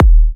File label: D# kicks; markus hakala kicks